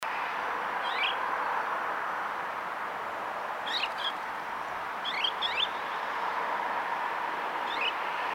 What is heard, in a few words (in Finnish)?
toyhtokiuru; Töyhtökiuru piti maassa yksinkertaista dvuih-ääntä, Lappeenranta 10.10.2010; Jarmo Pirhonen